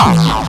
BD Distortion, kicks; VEC1

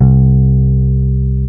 REAL BASS C2

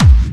BD Clubby, kicks; VEC1 Clubby

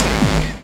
BD Distortion, kicks; VEC1

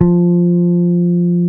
REAL BASS F3

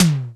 XR10 m tom, Akai XR-10

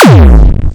BD Distortion, kicks; VEC1

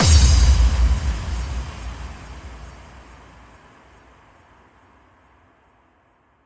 BD Reverb FX, Verb Kicks; VEC1

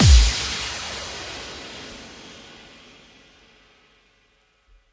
BD Reverb FX, Verb Kicks; VEC1

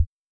Kick, Acetone Rhythm-Master

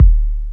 HOUSE KICK, Deep Hse Kicks; CF DeepHse Kicks

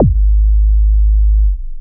Kick, Ghetto Electro Kicks

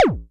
Synthe Drum, Daytone Drum-Synthe-RDS

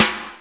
XR10 rim, Akai XR-10

